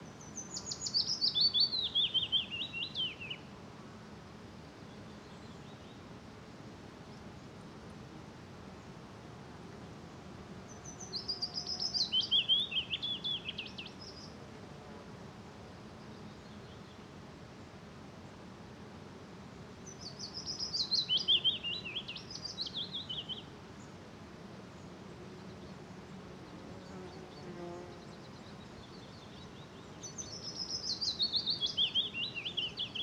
Forest Morning Small Birch Forest Willow Warbler Singing Many Insects Humming, Forests of Norway; AMBForst; Quad; Justsoundeffects